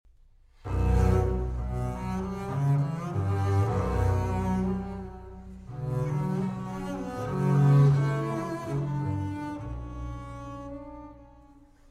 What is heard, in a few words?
Double Bass Duets